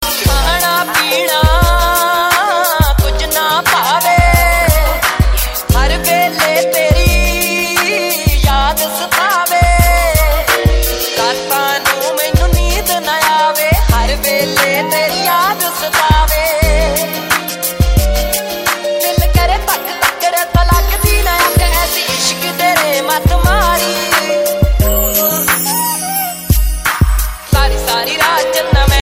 remix punjabi; mobile; ringtone

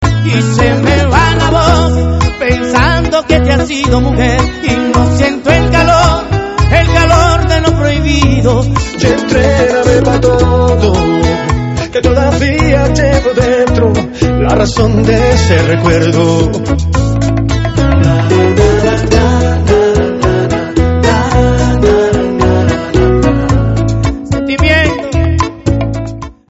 bachata ringtone

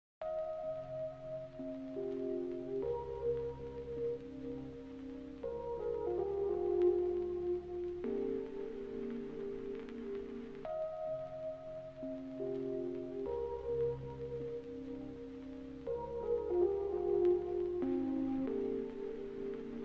slow music ringtone

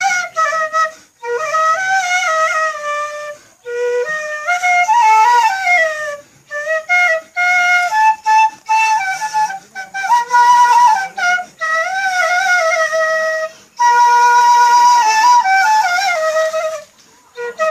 flute music; ringtone

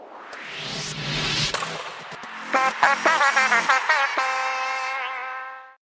sms tone; ipl 6

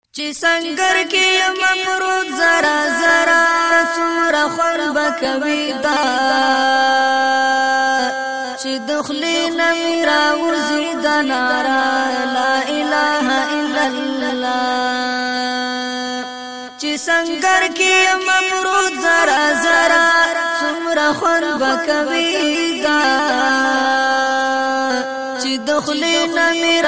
pashto tarana; ringtone

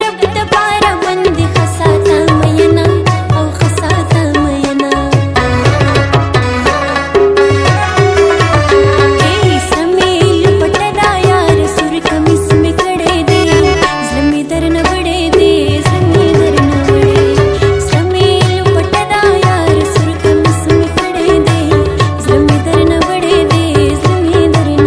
pashto singer

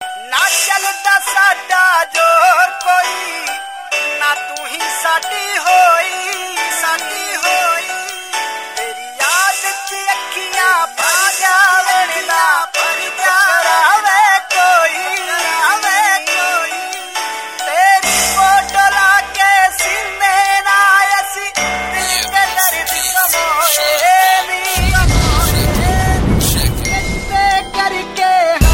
punjabi sad ringtone

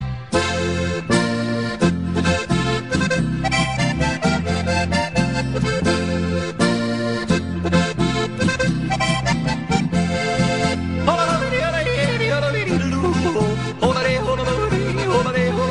yodeling ringtone

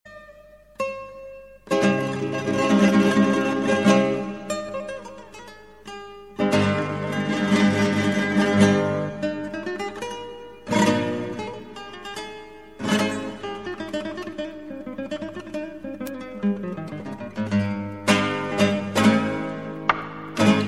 flamenco dance, ringtone

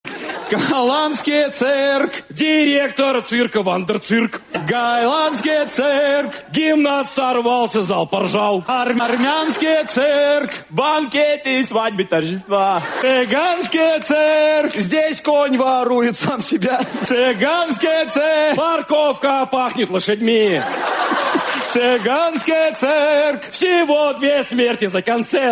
circus russian, ringtone

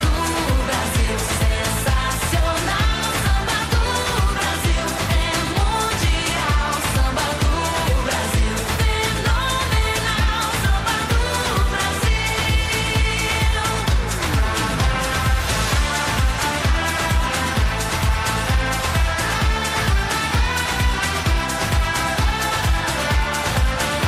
ringtone

